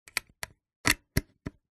technology quater inch phono lead connector plug in speaker